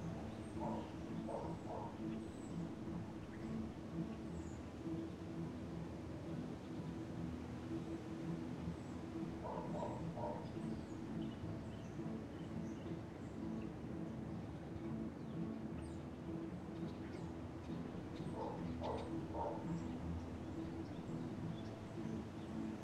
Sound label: ambience general; colossal pinatas